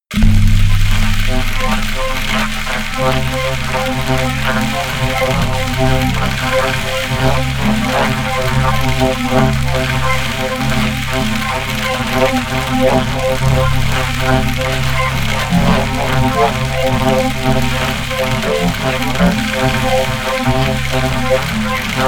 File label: atmosphere crazy didgeridoo low